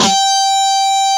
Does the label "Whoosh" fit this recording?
No